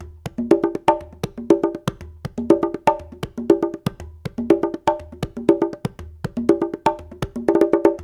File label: 120, Latin Congas; African Contemporary; Spectrasonics; Supreme Beats